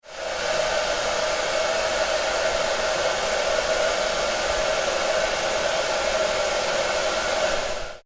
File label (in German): Fön